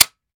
fire first; gmod; viper

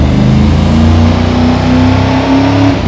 second, vehicles

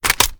kilo98 reload fast bolt open; gmod; viper

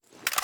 reload xmag mag in; gmod

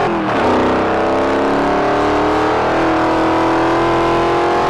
second, vehicles; silverado

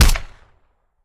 weap sup plr, weapons; gmod

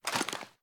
reload empty fast rotate; gmod

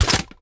ar akilo47 drum reload empty mag in; weapons; viper

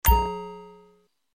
notification; gmod; paris

